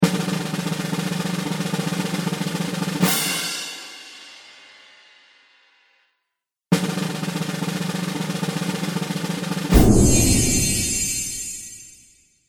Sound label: Drum Roll With Magic; Cartoon Music Sound Effects